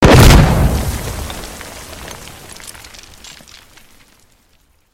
Panzer Explosion; Criminality

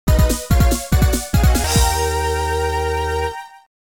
Stage Clear; Super Fighting Robot; arcade

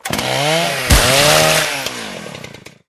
Dark Army Barrack Brutal Strike

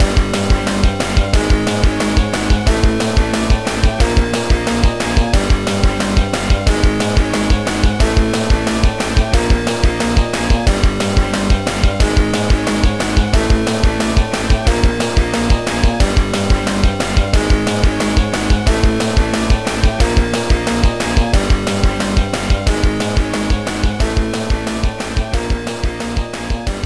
BGM minigame boss

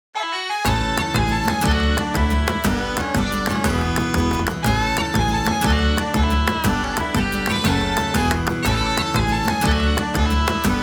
Shop's Jig ～Bagpipe～; New Atelier Rorona Story of Beginning Alchemist of Arland

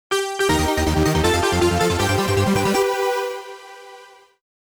Game Start GAME START JINGLE; Arkanoid DS

